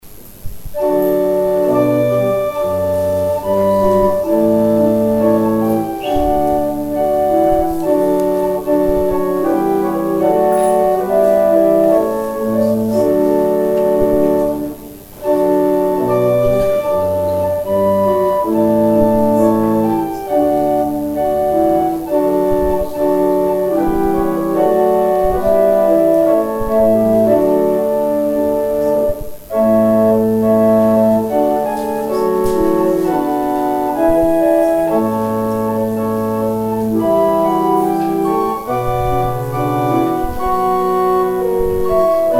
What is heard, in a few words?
organ interlude